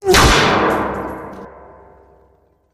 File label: Bullet Impacts Sheet